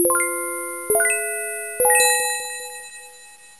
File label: unlock